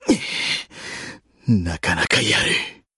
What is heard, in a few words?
damage; Seox; Damage Taken; っ…なかなかやる; Ugh.... not bad.